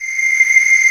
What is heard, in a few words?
ANGEL-BREATH, Akai S6000, dance; WORS STATION; DANCE #3